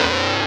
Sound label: Synth Shots; Vengeance Samples